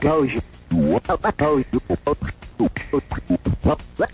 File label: 116 vox scratching; MELODIC LOOPS; SAMPLED MUSIC LOOPS